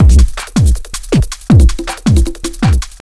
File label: JUNGL 160, JUNGLE LOOP